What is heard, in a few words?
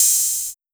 Open Hat